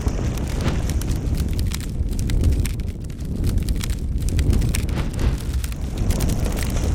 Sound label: Misc Torch Loop